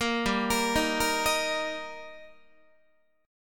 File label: G Minor Sharp 5th G Guitar, chord; arpeggiated; JGuitar